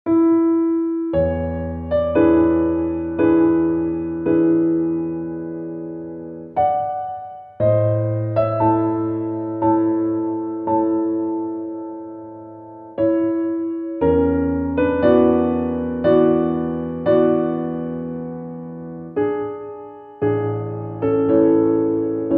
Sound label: prelude concert; chopin; prelude 7